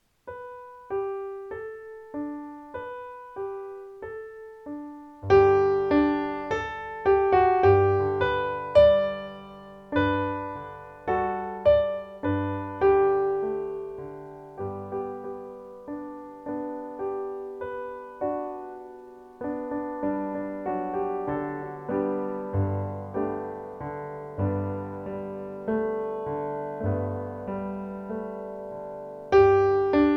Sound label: A Christmas Antiphonal - Solo